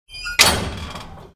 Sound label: Metal Door Close; Don't Be Afraid The First Toy